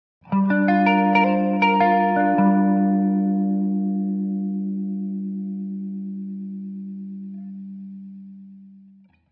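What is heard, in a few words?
Electric Guitar Melancholy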